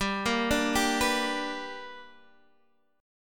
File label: B Minor Major 7th Sharp 5th